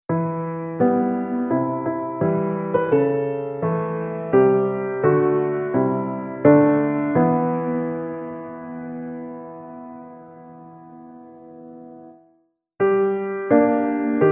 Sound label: avengers infinity war piano